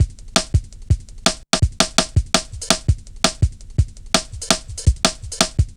Rim Shott, Drum Loops 166.5; Zero-G Jungle Warfare 1, 2 & 3

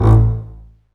STR CB, Cb Bowed, Cb Marcato; String Master Series

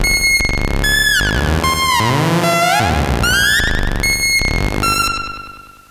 Space Tweets, sci-fi

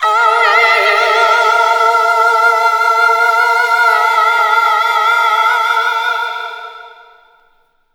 ONLY YOU, Funky Vocals, SHOUTS; USB Soundscan vol.18

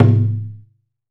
OO DAIKO, taiko; AKAI S-Series CD-ROM Sound Library VOL-5

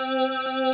MECHA VOX; CMP - Analogon

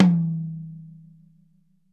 HIGH, drum kit; KD KITS; Sampleheads - New York City Drumworks VOL-1

